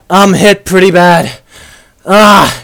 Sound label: wound